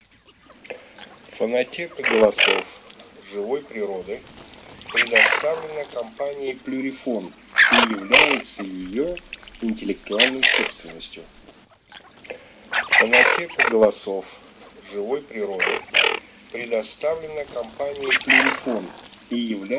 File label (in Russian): Чернеть Хохлатая Moretta; plurifon